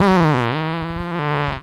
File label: fart medium